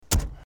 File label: Tractor Door Slam